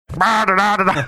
imitating blown out music radio

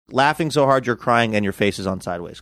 laughing so hard you're crying

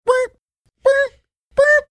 making funny punctuated sounds

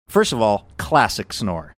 classic snore

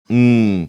"mmm"

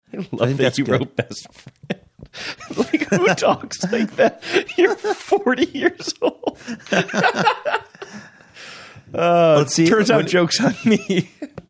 laughing saying love that you wrote best friend

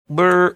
funny mouth sound

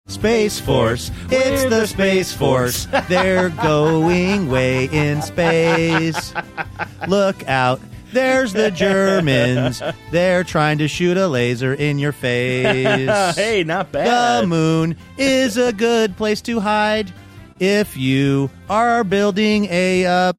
singing version over midi; space force theme

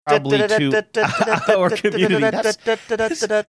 making teletype sounds

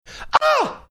helm scream

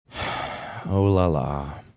sighing oh la la

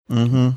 mmm hmm

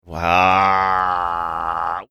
making a droning sound

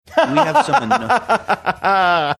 laughing

